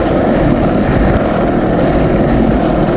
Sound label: roll plexiglass, terrains; Tony Hawk's Project 8; XBOX